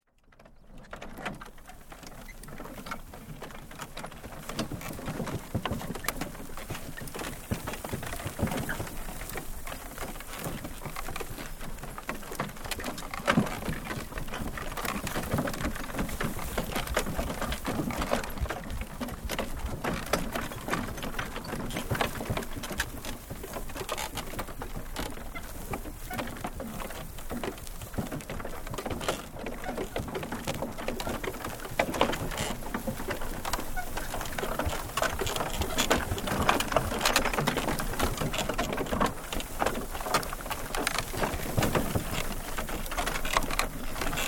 Horse and Cart, Travel over soft ground - lots of wooden cart creaking; Horses; Animals; Tom Hutchings